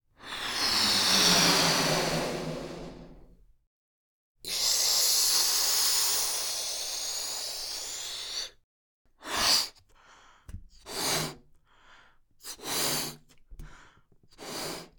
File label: Balloon Blow